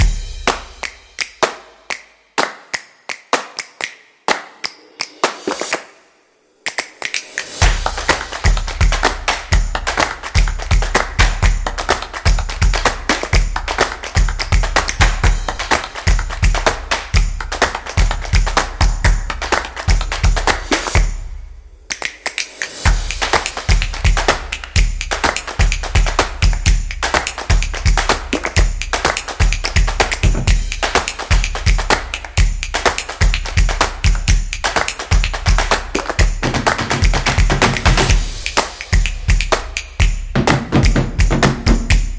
Percussion Hit; percussive nation vol 3

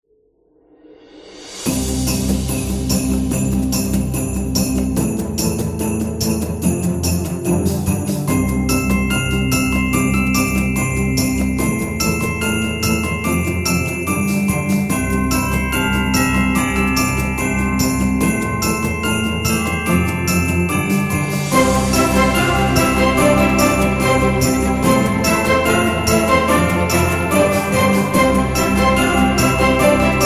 Christmas Song

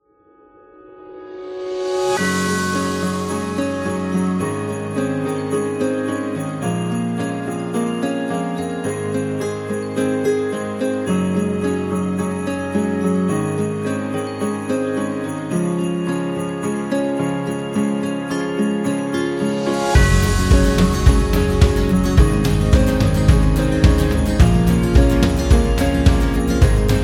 Winter Music; xmas

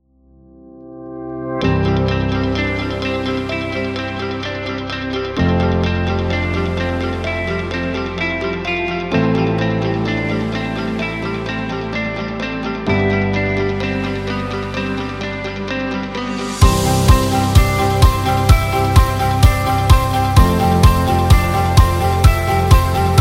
Dynamic Corporate Rock

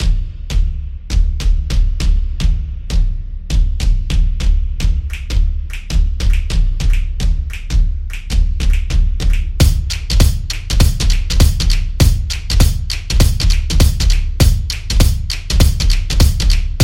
Future Beat; drumming action

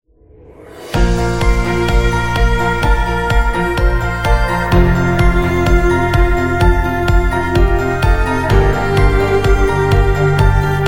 Emotional Cinematic and Positive; corporate music vol 2

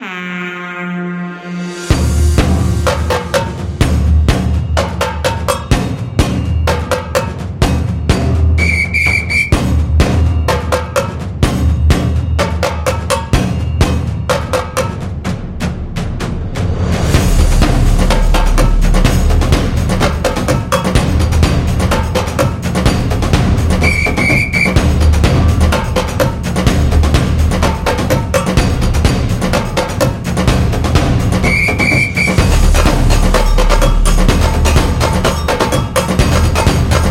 Football Drums

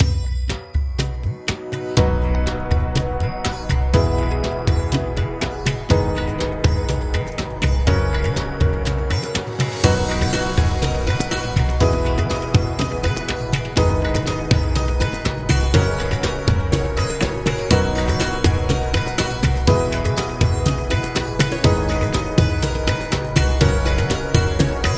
Travel India

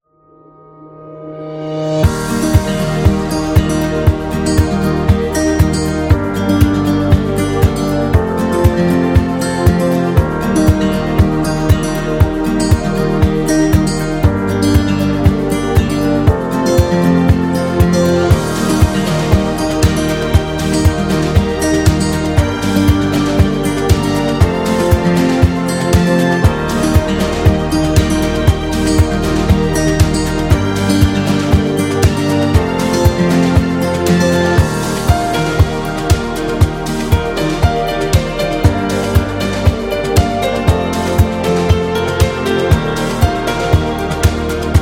Worship; wedding music

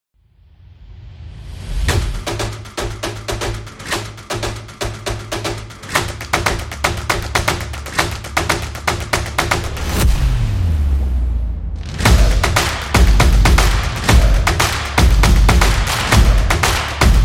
A Drum

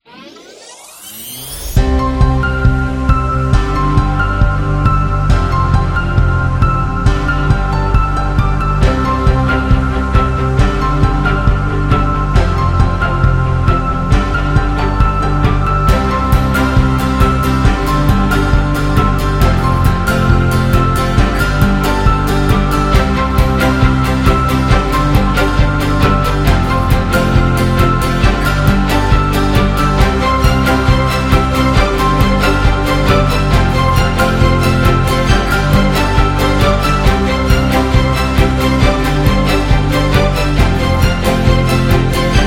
Cinematic Strings and Piano; corporate music vol 1

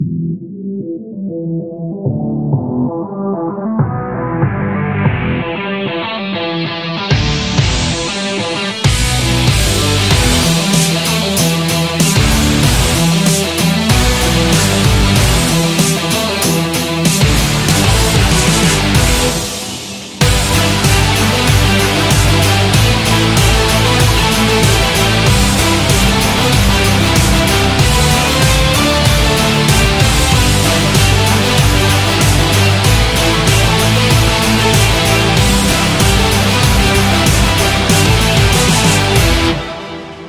Rock Upbeat; corporate promo

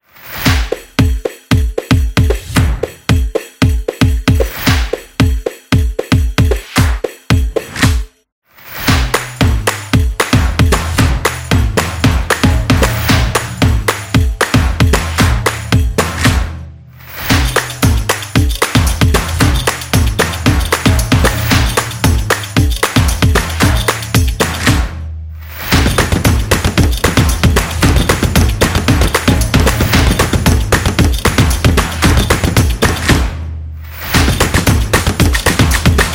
Motion Graphics Percussion

